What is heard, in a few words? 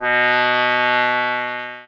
sax